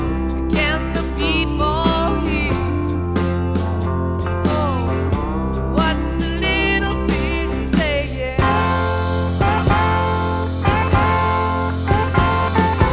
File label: rock